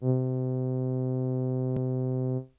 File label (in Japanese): ミニ・モーグ, 電鳴楽器